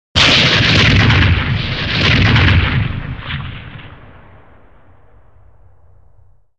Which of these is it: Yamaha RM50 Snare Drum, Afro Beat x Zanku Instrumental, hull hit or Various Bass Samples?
hull hit